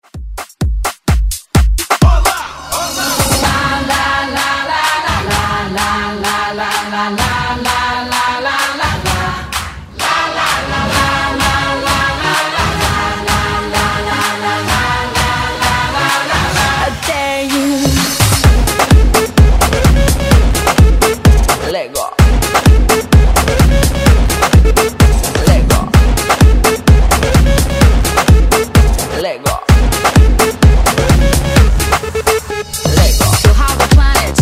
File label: Afrobeat Redrum